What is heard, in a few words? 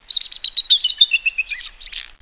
eagle sounds